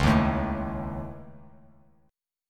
C sharp Suspended 2nd Sharp 5th C sharp Bass Standard, chord; strummed; 9,6,7,2